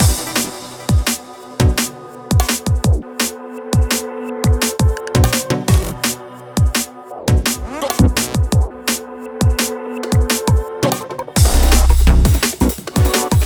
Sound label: Boss fight; Sky Force Anniversary